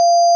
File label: error